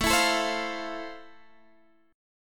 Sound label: F Major 7th A